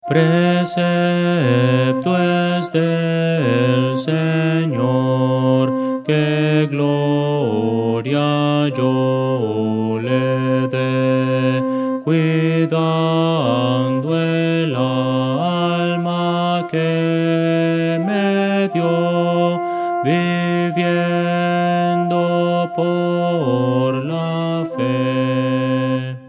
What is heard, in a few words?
contralto